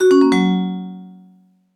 Announcement End

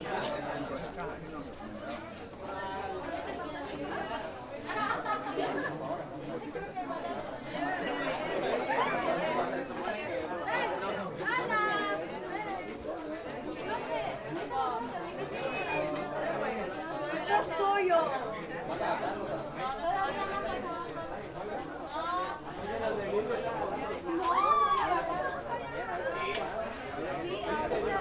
School Recess Ambiance

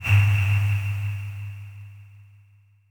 Electronic Attack

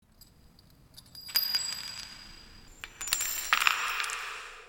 Bullet Falling

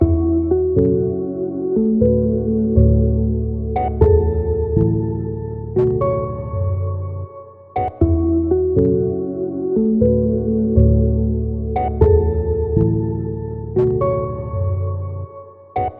Piano Ambiance 120 Bpm

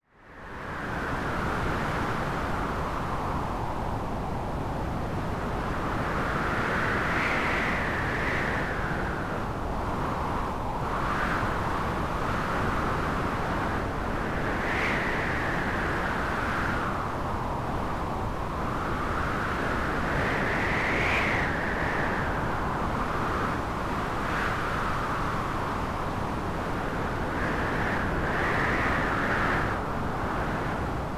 Wind Synth Very Fierce Winter 3osc

